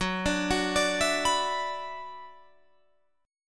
F sharp 7th Suspended 4th Sharp 5th, Guitar, Open F; F#7sus4#5 arpeggiated